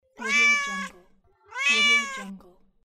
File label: cat meows